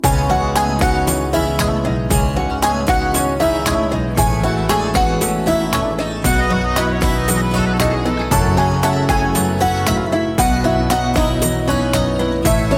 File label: soft piano ringtone; bollywood ringtones